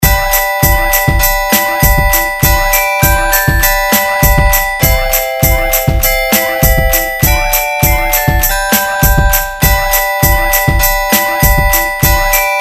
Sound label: bells